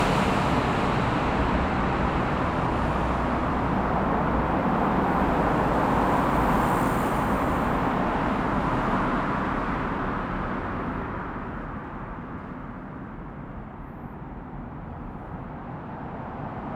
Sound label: CAR XY